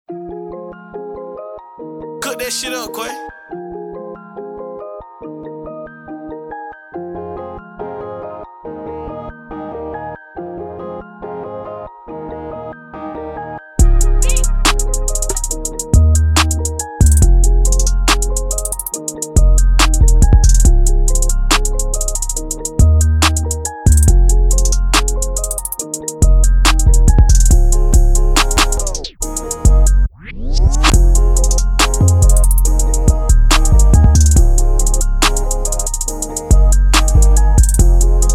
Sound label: Instrumental